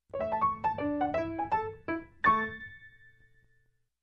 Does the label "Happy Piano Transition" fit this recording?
Yes